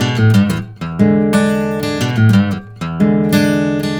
BARI RIFF 120, Blues Guitar; ILIO; Fretworks

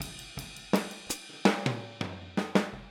Boogaloo Fill Hi-Hat, 82 BPM; Sonic Foundry; Drums from the Big Room The Mixes

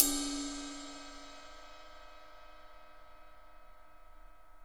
RIDE 2 LO R, Interactive Drum Kits; Sonic Reality

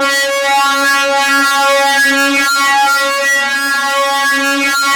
FLYER C#3, Yamaha VP-1

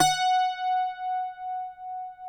CUATRO; Spectrasonics; Hans Zimmer Guitars

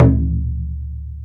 Taiko; Digital Sound Factory Planet Earth

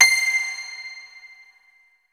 HARP C5 R, SYNTH PLUCK; Music Store - Claudius Diemeter Schwerlos

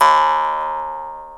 Jaw Harp; Multisamples; Digital Sound Factory Planet Earth

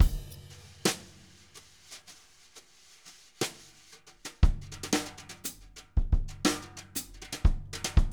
jazz brush loops

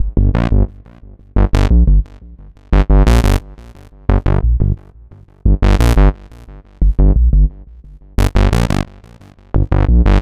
Synth Bass

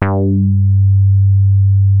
DARK HARD G2, ANALOG BASS; Music Store - Claudius Diemeter Schwerlos

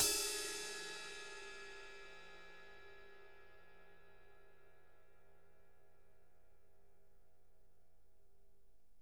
RIDE

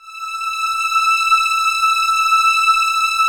Synclavier Keys; Guitars

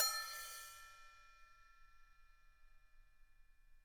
HH Bell up, drums; PX7; Digital Sound Factory Protean Drums

